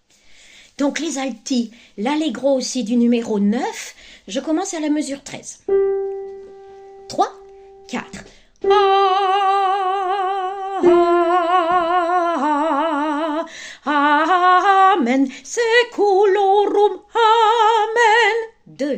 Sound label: Allegro Alto